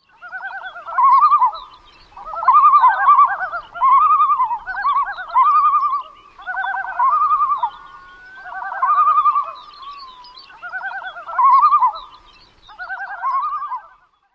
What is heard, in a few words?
tremolo calls